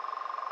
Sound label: free Spin Reel Spin; fruitshop